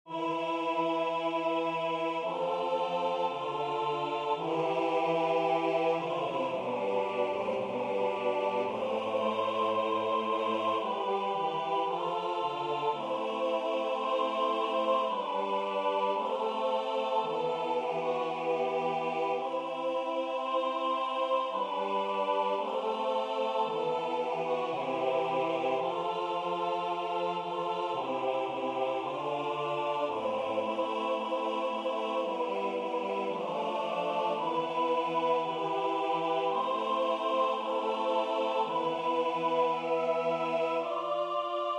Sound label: SATB; mille regretz; josquin des prez